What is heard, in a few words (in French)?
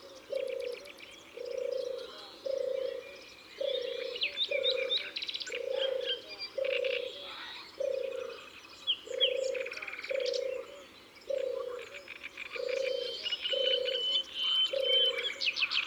Tourterelle des bois ABC des chants d'oiseaux